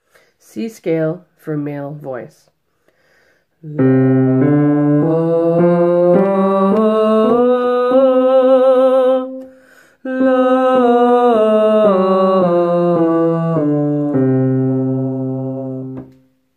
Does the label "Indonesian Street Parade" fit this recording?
No